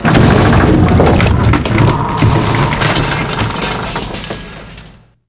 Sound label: hit to board; shipcharge; Pirates Of The Caribbean